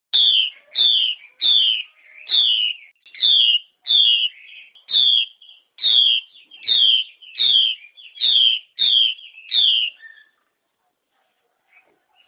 hwamei betina

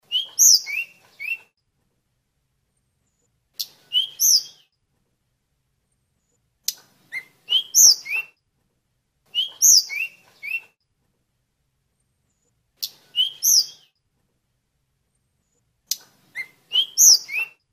suara betina tledekan

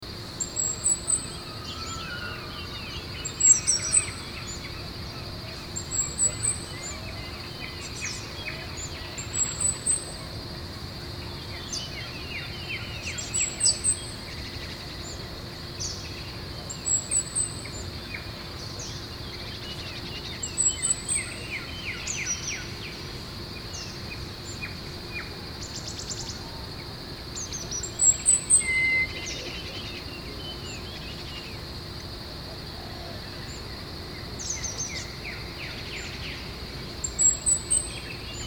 suara burung cabai gunung

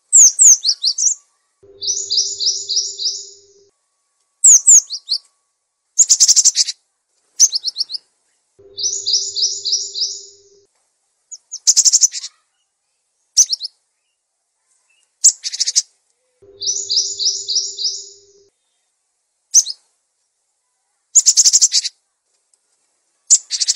kombinasi glatik batu dan kolibri

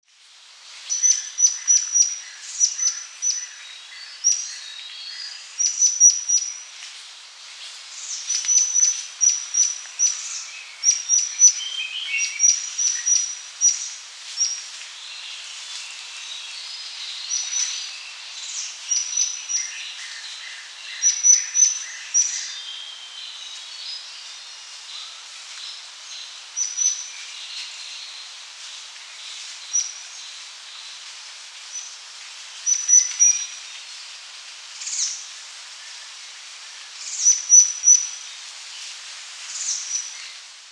burung murai besi murai cai murai air mancur

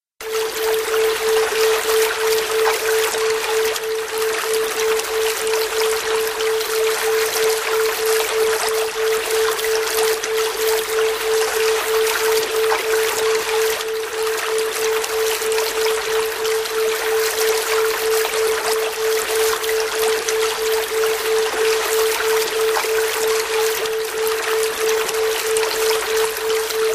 terapi air mengalir

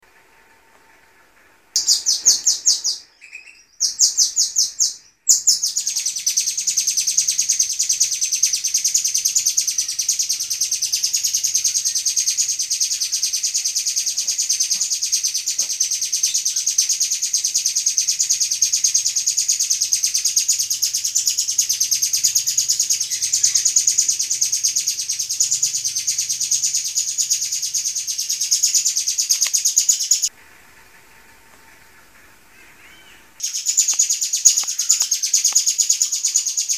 suara asli panjang burung rio rio

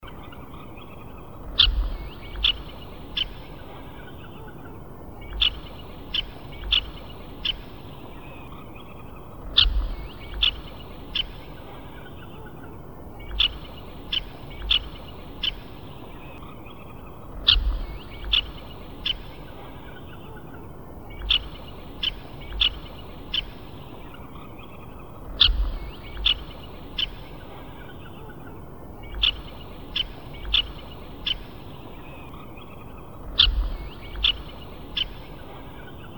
suara panggilan burung red necked phalarope

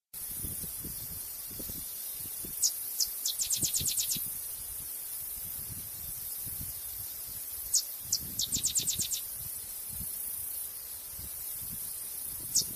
suara ciblek hutan

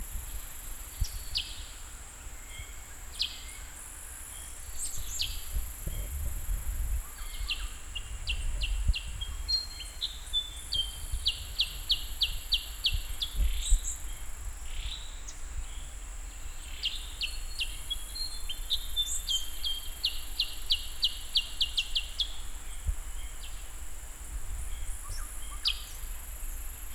burung cep cep atau cucak kurincang

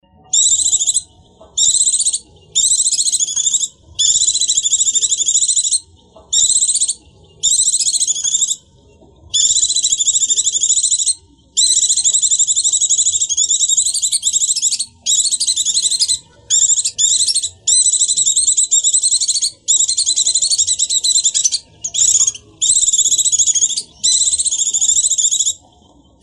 pleci ngecir

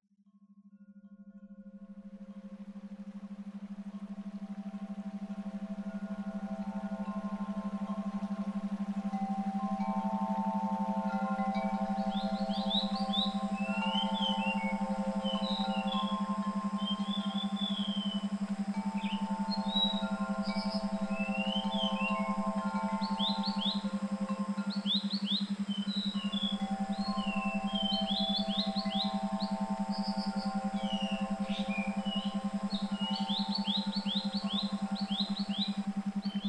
terapi brainwave

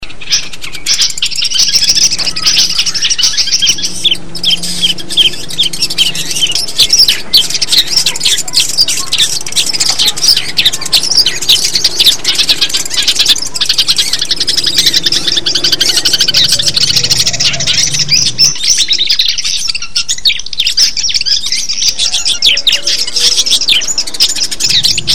simulasi lomba cendet

